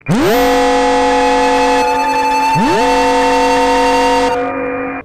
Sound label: rushing sound